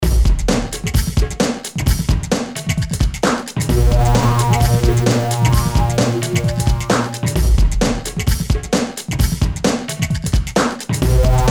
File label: Breakbeat Techno